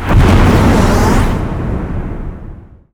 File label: deafening blast; weapons; dota; invoker